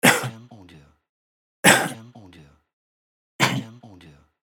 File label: Male Cough